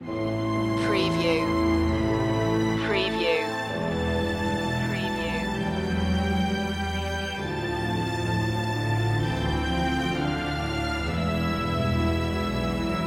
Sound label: the death of a hero no choir